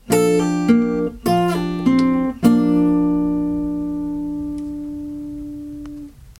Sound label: e shape minor embellishment lick